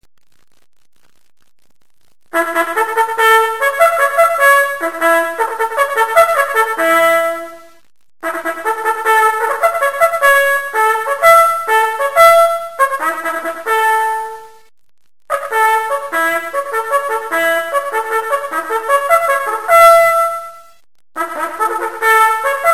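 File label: bugle reveille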